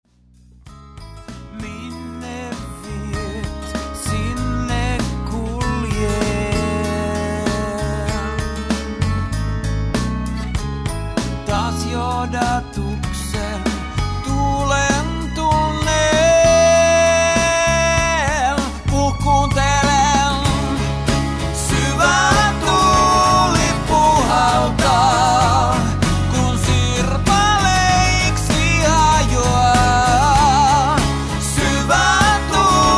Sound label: unplugged